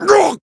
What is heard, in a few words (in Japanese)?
Voice damaged jp